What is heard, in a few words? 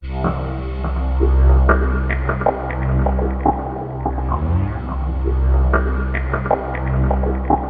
C2, SynthLine; 90 s Sample CDs; NiceBeats; SynthLine VOL-5